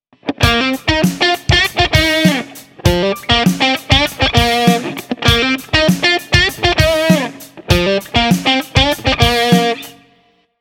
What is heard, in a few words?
Prebend Pentatonic Lick